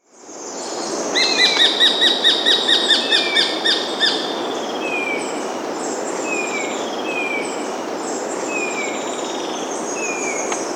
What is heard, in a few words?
Black-Billed Treehunter (Thripadectes Melanorhynchus), Andean Birds; S. America